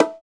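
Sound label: PER PROCU, Procussion, percussion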